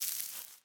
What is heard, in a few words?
Defeat Hat; Smite; Susano